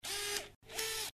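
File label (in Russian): zoom obektiva fotoappar, camera lens zoom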